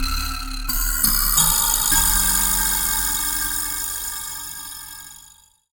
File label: Lo Vibraslap